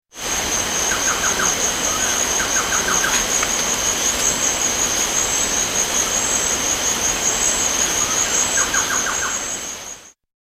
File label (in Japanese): 雨; ジャングル